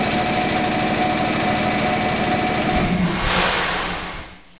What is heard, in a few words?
Compressor C2000BL End